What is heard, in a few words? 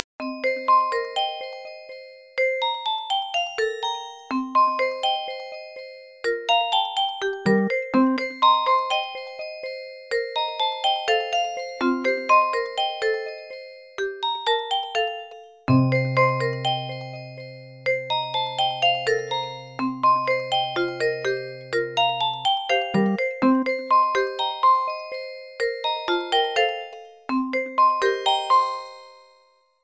nokia ringtone